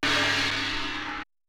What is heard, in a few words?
Crash, Cymbal; Ableton Live 12 Lite; Core Library; Drums